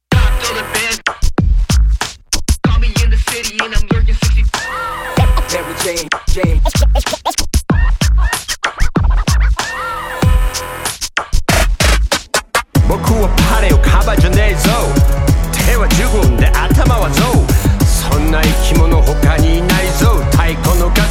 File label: rap